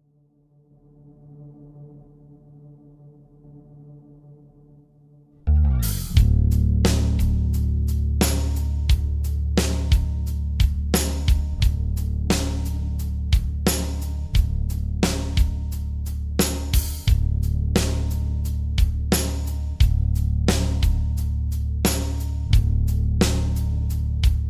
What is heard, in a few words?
rhythm